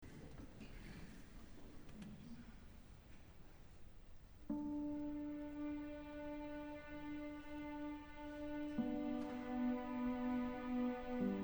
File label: violin concerto; bartok